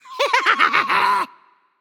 nv tongue; smite; voicepack; hachiman

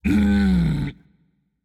tongue, voicepack; smite; jorm

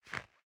armor; smite; ahpuch skin10

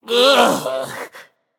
ugh; smite; voicepack; jingwei

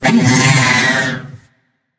sigh; smite; ahmuzencab

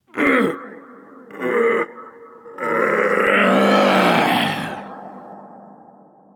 nv grunt lift; cernunnos

